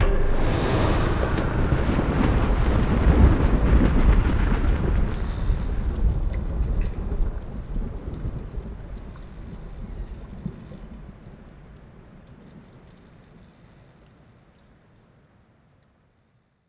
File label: intro Screen Trans; netent